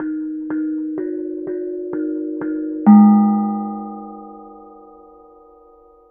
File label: KENONG; Spectrasonics; Heart of Asia; KENONG PH